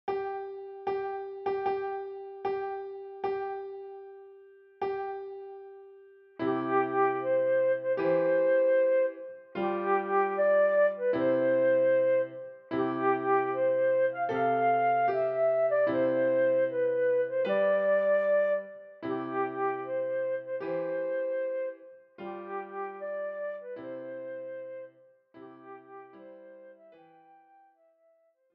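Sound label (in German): Hochzeitsmarsch Querflöte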